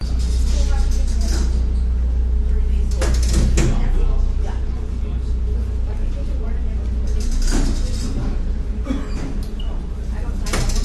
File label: seabus station walla steps walking people ambience thumping; vancouver; lonsdale quay; your free sounds